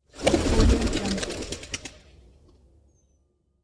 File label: bird wings flapping